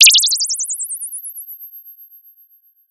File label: Sound of a bird tweeting nicely